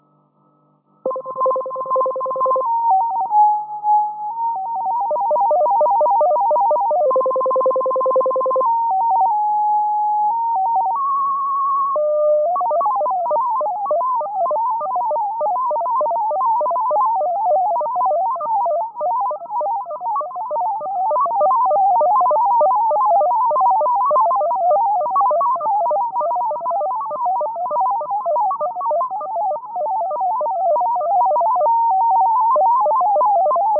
MFSK-20 AM, Russian intelligence 16-tone MFSK at 10 and 20 Bd; MFSK-20 at 20Bd with start of a transmission; MULTITONE SYSTEM